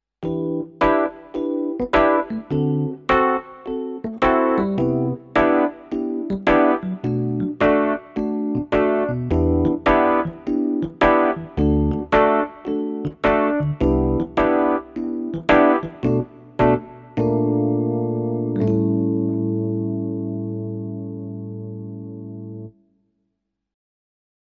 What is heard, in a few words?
Korg PA4X EPianos